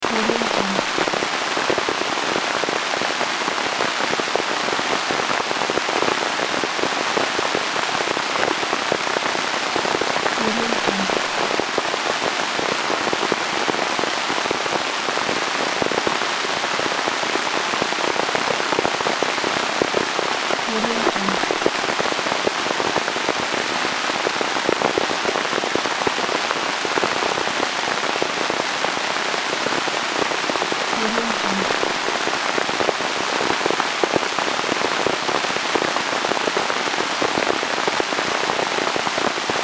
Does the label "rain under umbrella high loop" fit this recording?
Yes